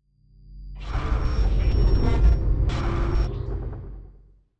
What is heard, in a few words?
Climax Ident